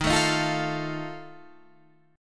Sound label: D sharp Suspended 2nd Flat 5th, chord